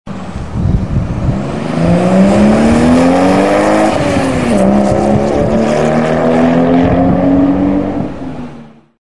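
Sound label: sound of car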